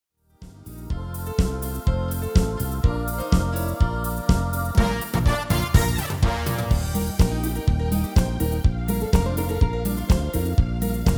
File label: MIDI